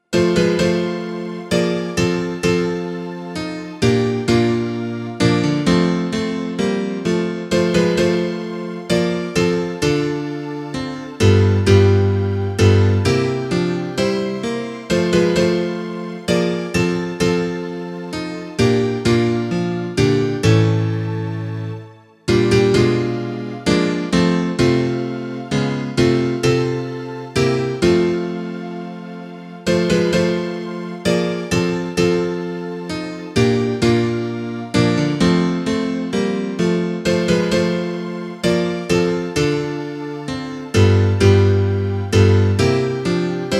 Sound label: instrumental